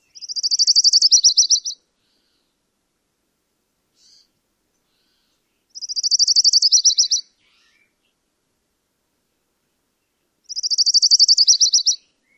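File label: songs, Colima Warbler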